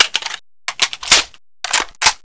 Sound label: reload smg